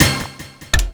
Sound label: Toad House Stop Mini Game; Wii